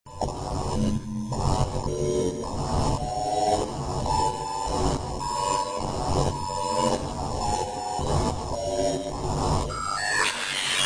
osc drive; crusherx

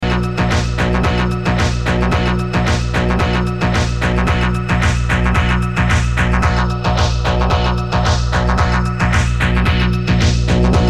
cluster LFO

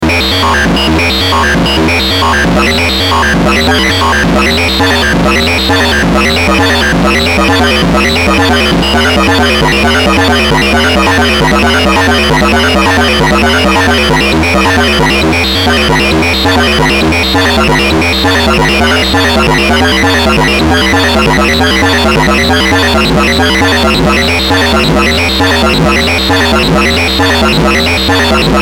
dynamic

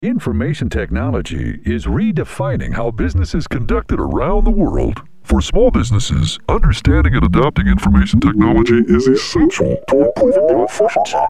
voice manually down

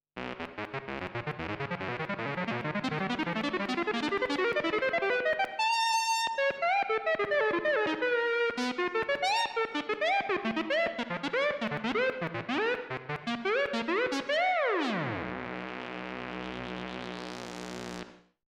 free scales

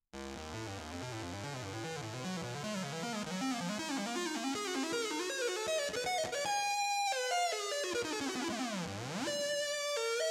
scales gliss

